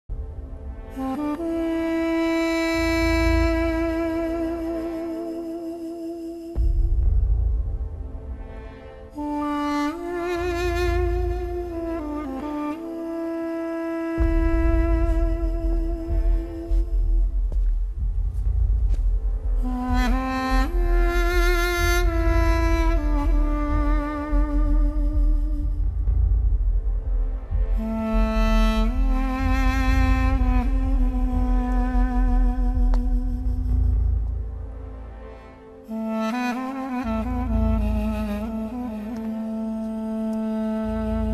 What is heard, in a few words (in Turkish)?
Enstrümantal fon müzik; Mehmed Bir Cihan Fatihi